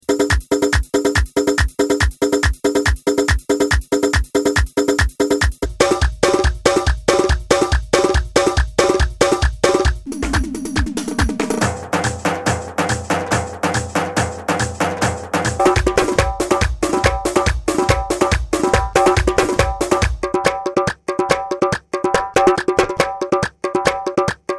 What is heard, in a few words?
kordi